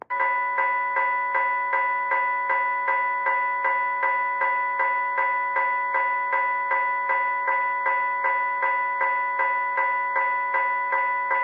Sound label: Early GS, Electronic, General Signals